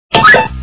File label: join; MRSHot2003